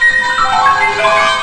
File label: crystal, electronic sounds; Sound Effects for Multimedia - Volume 1